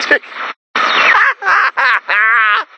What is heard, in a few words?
Laughter; LARS; Stonewood